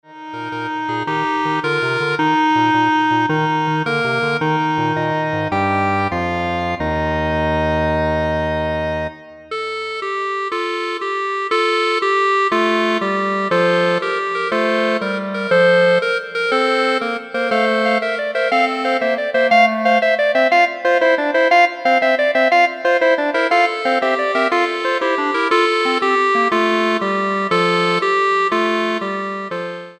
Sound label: Clarinet Ensemble